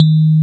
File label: TINE; DIGIJAZZ; East West - Twisted Textures-BT CD1